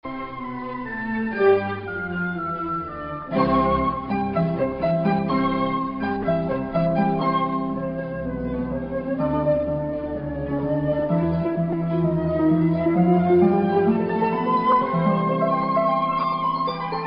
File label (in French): harpe; Allegro du concerto pour flûte, harpe et orchestre en ut majeur, K299 de W.A. Mozart